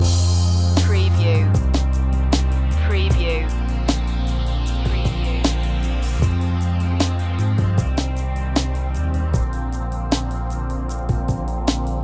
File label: natural impulse loop